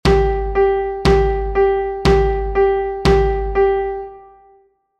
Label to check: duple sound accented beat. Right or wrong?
Right